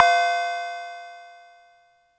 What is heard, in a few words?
Cowbell